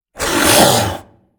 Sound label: Zomby Stressed; Blind Survivor